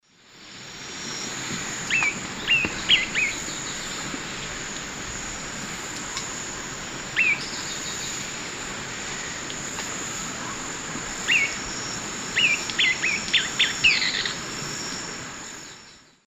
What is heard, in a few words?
Stigmatura napensis, call and song; S. America; Eastern Lowlands